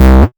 Bass C, Offbeat Bass

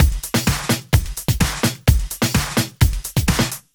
Housebeats 128BPM; Vengeance Samples

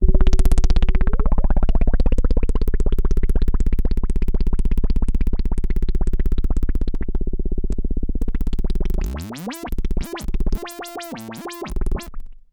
Roland F Noise; Roland S100; musicradar-synth-samples

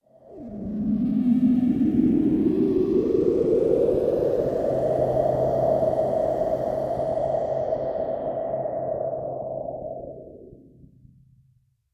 VFX Atmos

